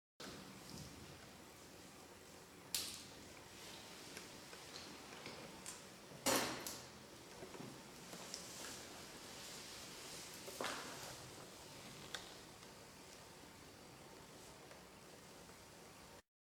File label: spitting, girls